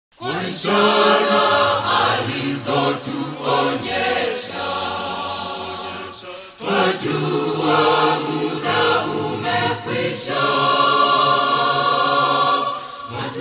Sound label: Choir; Kurasini SDA Choir